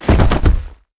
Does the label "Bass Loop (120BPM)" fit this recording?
No